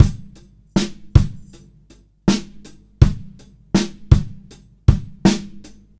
Drum loops, Boombap

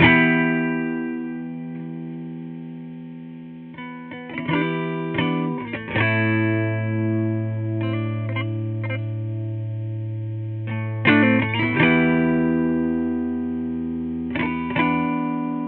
guitar tragic, Sad; Loops

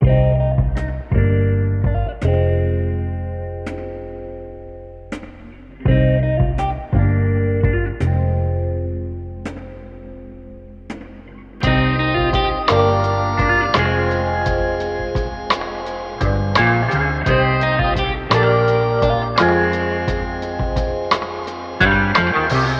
guitar, Loops; Phonky

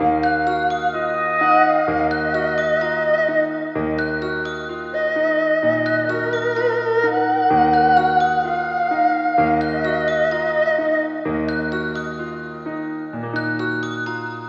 piano flute voice, Loops Sad

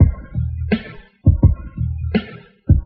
Drum loops, Boombap

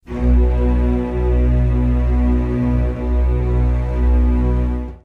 lownote, String

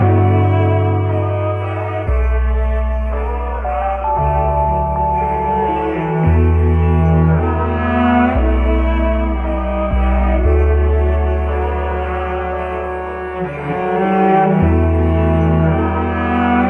strings, sad

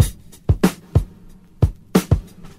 echoed, Boombap drum loops

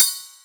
Hats Real Open

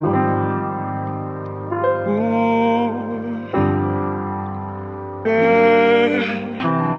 piano and vocal, Sad; loops

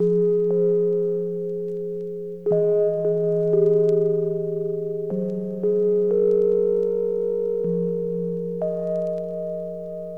keys, Loops, Sad

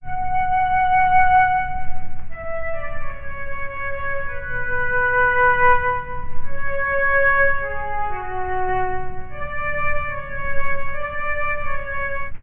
flute, Loops

